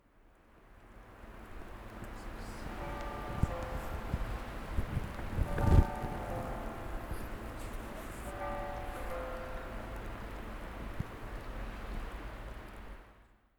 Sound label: Churchbells